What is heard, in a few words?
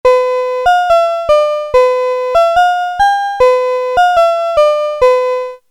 Casio VL1 Piano